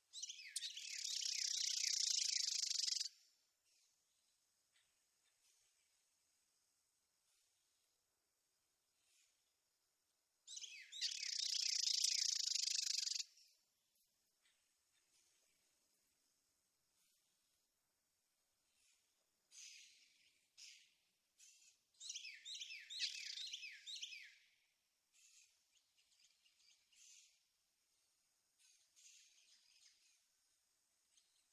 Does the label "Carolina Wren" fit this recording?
Yes